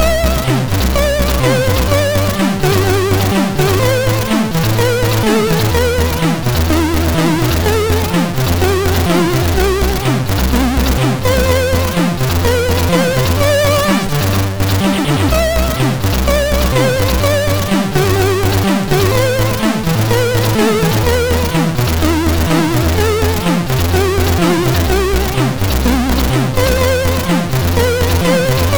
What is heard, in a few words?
Russian anthem sid MOS6581R3